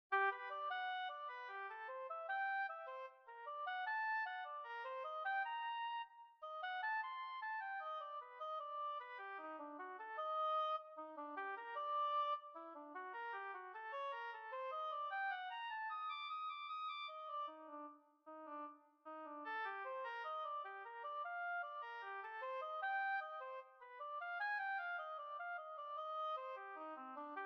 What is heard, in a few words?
Oboephonic